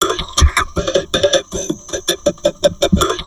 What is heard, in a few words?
TALK 160, Drum Loops Crazy Processed; USB Soundscan vol.07; AKAI